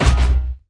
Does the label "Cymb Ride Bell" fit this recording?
No